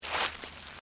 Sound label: FOOTSTEPS THONGS (FLIPFLOPS) GRASS LEAVES STEP; Footstep Foley; Human